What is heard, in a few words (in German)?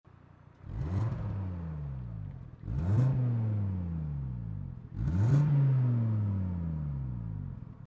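AGA zu Gasstoß, Stoll Sport Abgasanlage Audi RS 3 Limousine 8Y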